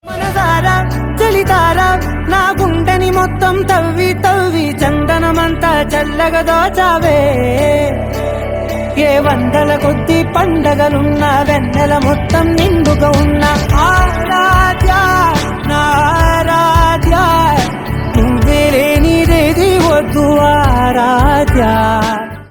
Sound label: Telugu Song Ringtone